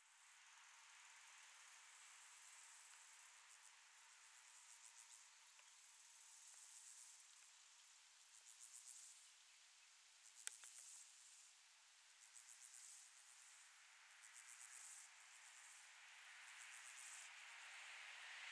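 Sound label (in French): Bord De Route Allemagne Passage Voiture Et Sauterelles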